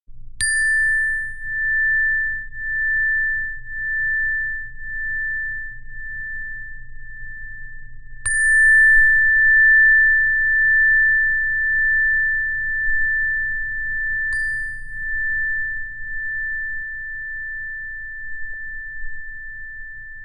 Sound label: zenergy chime; No Agenda